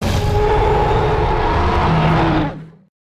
roar; Spinosaurs

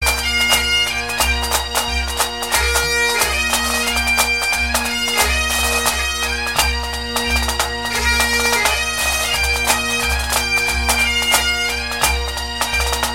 Bagpipes; BlackWatch

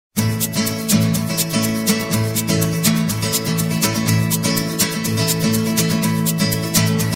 Guitar Sms

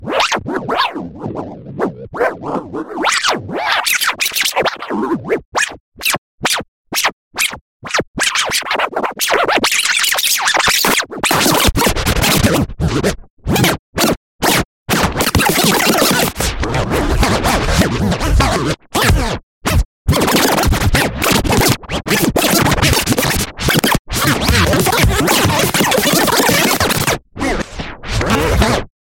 Dj Mixer Music

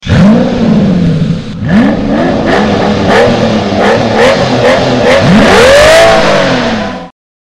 Ferrari Sound

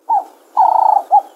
Turtle Dove Sms

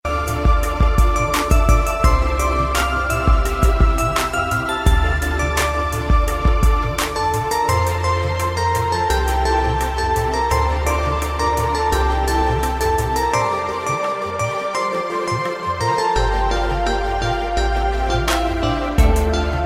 Piano Soft

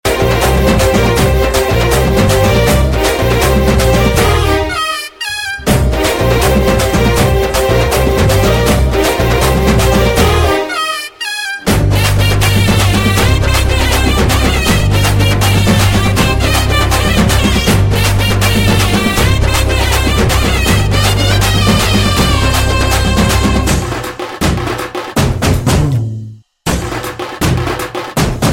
Background Tune

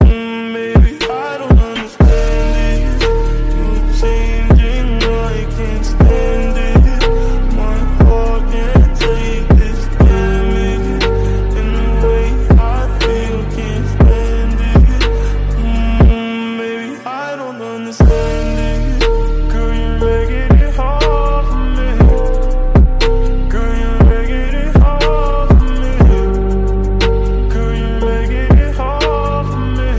Remix

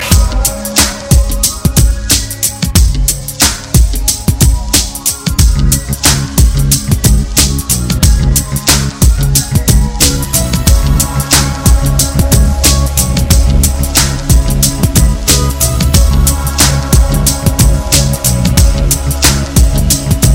Instrument Bang

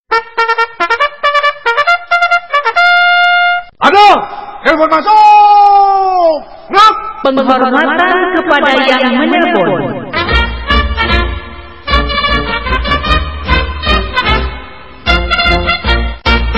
Military Calling

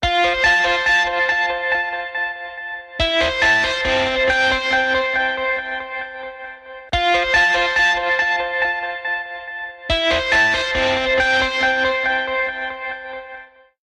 Lumia 1020 Ring

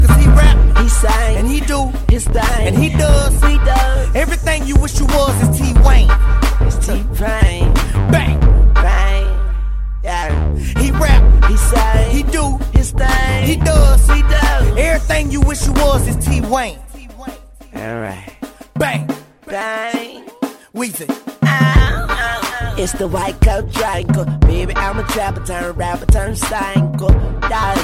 He Raps He Sangs

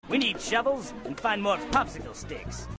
Shovels and Sticks

